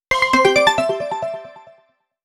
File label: Item Jingle; super mario maker 2